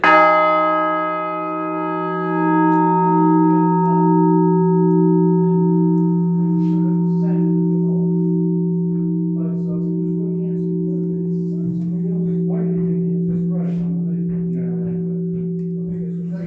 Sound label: horsham, bells